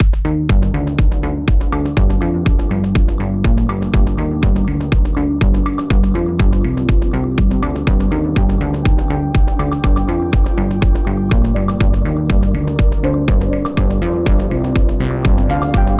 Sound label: EDM House Attack 05 Release 12 Log Ratio unendlich Warm AutoFast